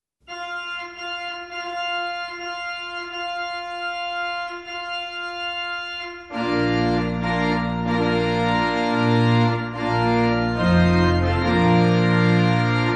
Bridal Chorus; classic wedding music

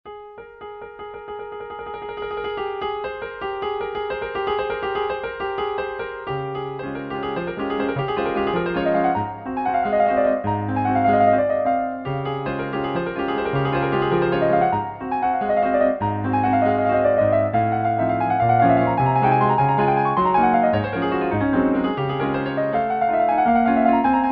Minute Waltz

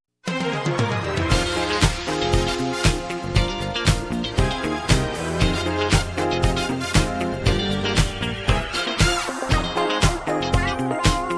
Disco Saturday Night

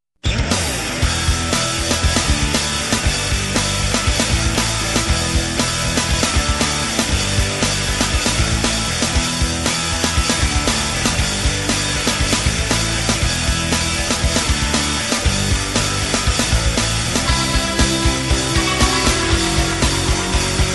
Alt Thrash Soup, workout music

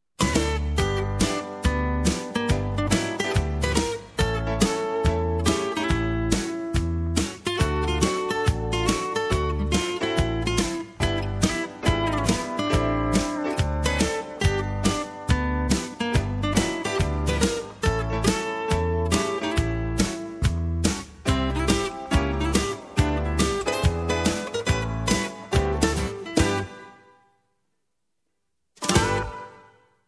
Slow Country Jazz, bluegrass music; Album: Country Music

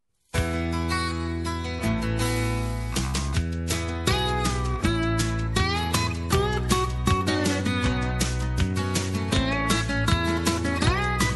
Acoustic Country Ballad, bluegrass music; Album: Country Music

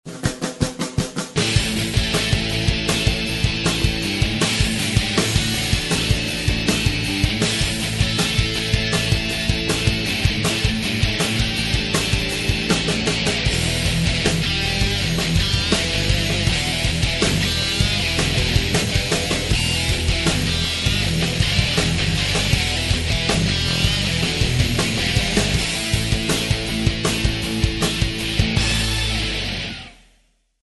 Big Rock, workout music

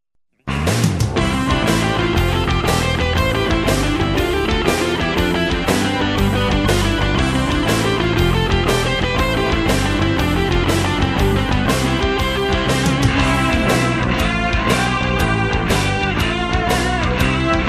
Jesu Joy Metal; Album: Contemporary Holiday Music; christmas music

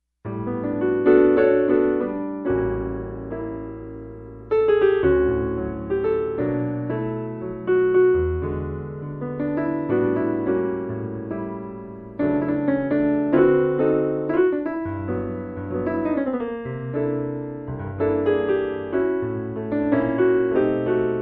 Romantic Dinner, relaxing music

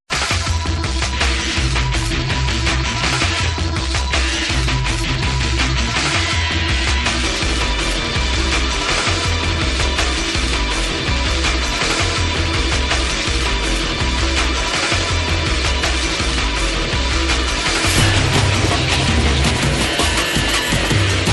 Distorted Techno Chaos; Album: Suspense Music; suspenseful music; creepy music

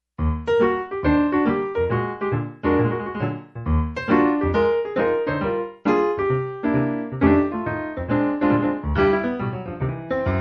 Early Jazz, relaxing music

